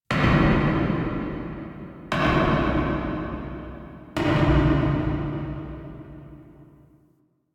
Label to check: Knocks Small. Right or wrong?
Right